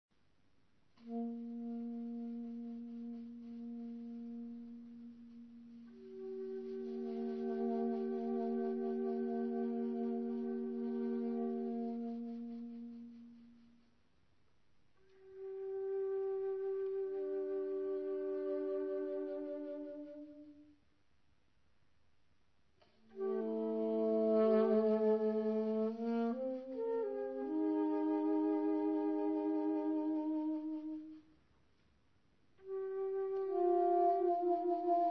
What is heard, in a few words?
sax